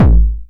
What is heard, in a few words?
909 BD Tape Sat